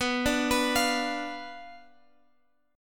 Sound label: B Diminished B down Guitar Open G